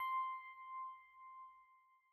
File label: Bell C5; Big Fish Audio - Old Skool House; OSH Construction Kits